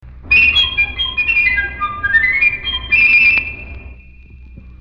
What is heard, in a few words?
piccolo, woodwind; woodwind sound clips